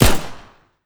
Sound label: pistol fire; weapons; npc